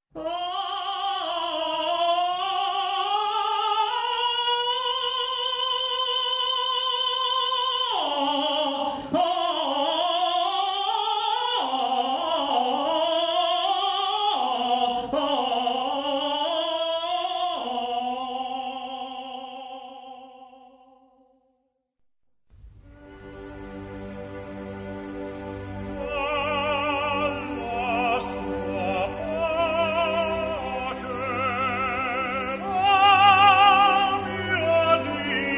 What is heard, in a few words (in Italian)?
tenore